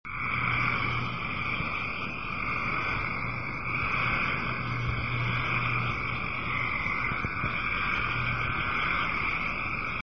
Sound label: frog